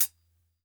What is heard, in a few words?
TING LP; AMG Gota Yashiki Groove Activator; TING LOOP 74